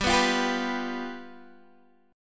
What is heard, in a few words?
G 7th G down Guitar Open D